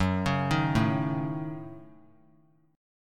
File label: F sharp Minor 6th F sharp; arpeggiated; JGuitar